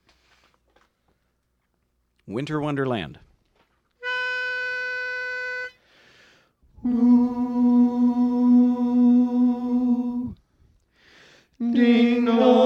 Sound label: lead; Christmas